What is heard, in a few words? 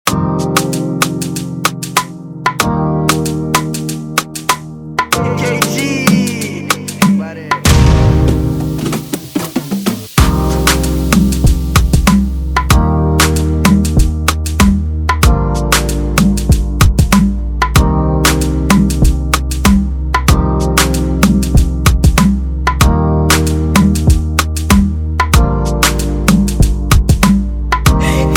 Instrumental